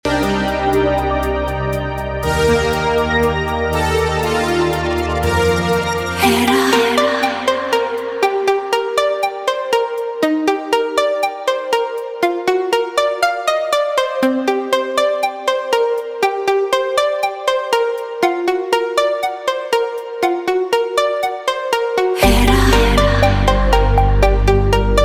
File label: Echo, tones; Hera